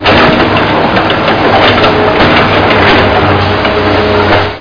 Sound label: mining